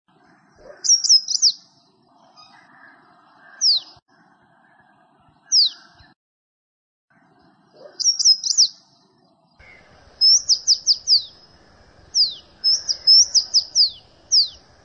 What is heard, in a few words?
purple rumped sunbird